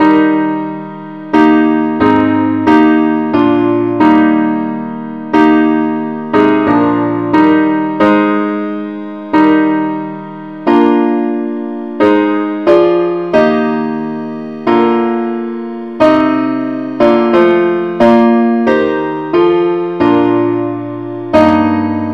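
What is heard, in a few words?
parts only, key e flat; christe Sanctorum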